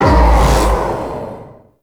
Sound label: Spear Gun Throw Proj Buff Hit; Hoplite